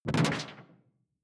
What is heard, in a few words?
sci-fi alien bubble; swooshes-swishes